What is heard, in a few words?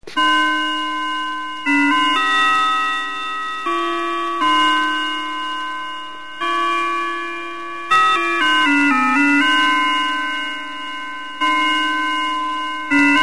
avemaria, clock chime tunes